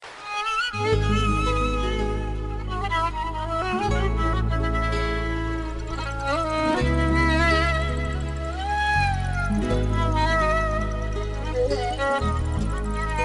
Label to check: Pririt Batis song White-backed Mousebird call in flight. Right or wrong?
Wrong